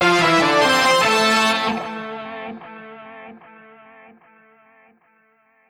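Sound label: promo logo brass and guitar; front-logo; WLS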